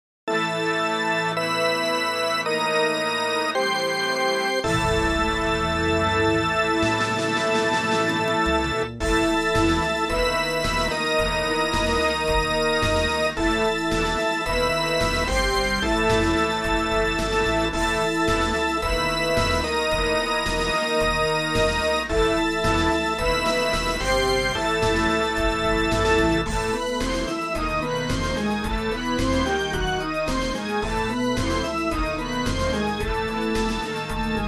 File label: Midi Battle; Shining Plume 2 Windows gamerip 2017